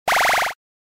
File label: Arcade 8 bit level up